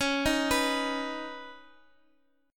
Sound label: C sharp 7th Suspended 2nd, Guitar Open D